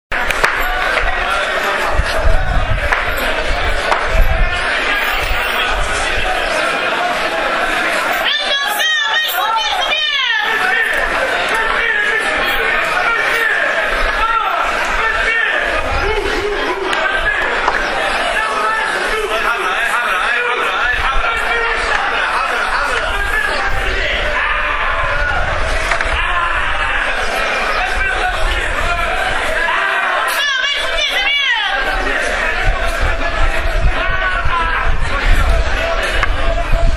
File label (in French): ambiance Enfant, Monastir Ville; TUNISIE 2018